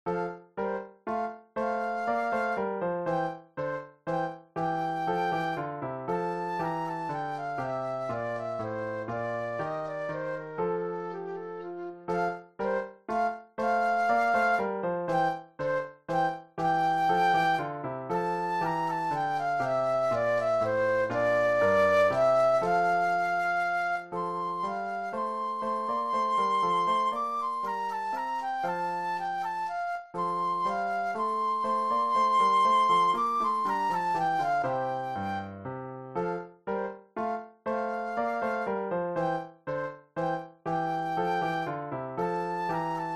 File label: Flute Solo; classical